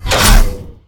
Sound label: power; zs power